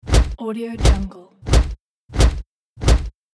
big robot footsteps

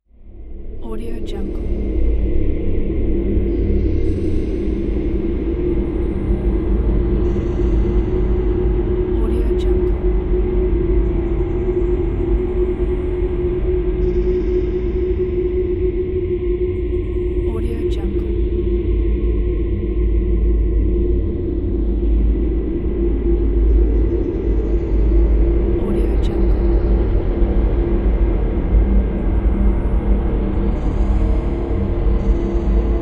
space ambience

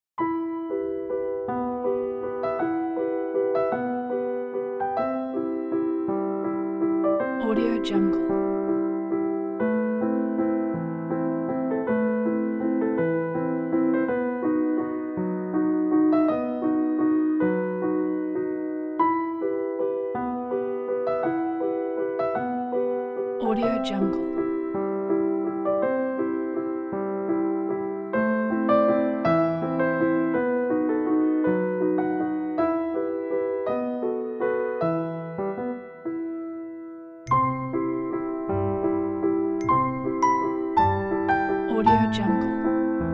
dreamy piano

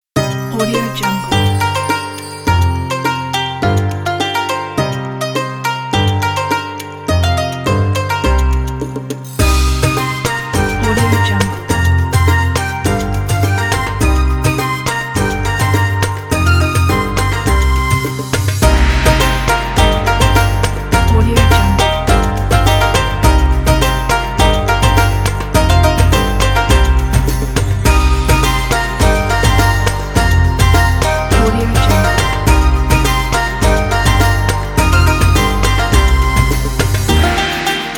chinese new year

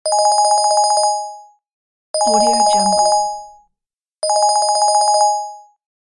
phone ringtone

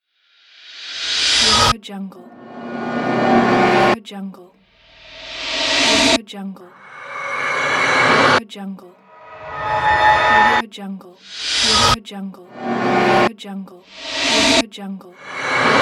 riser

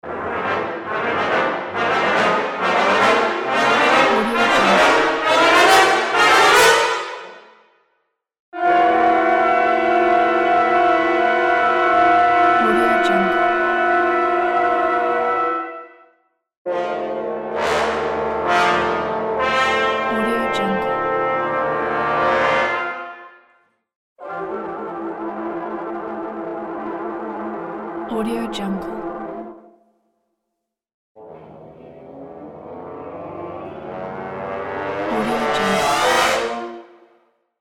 cinematic horror brass sound

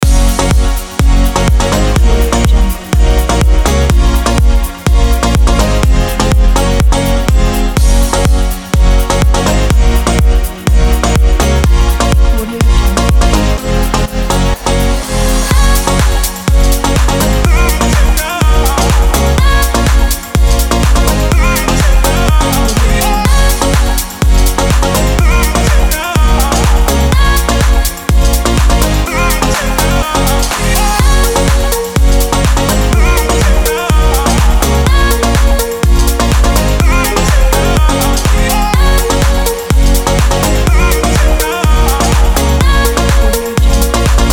summer dance pop

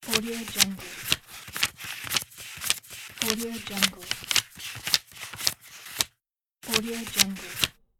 counting money

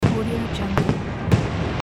firework

